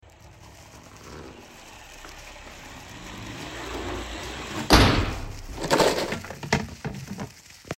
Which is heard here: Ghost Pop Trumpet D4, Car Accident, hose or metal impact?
Car Accident